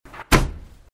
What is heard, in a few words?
hand slamming table; wood